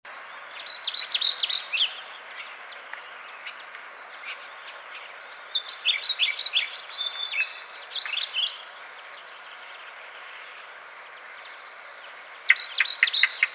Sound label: Ficedula hypoleuca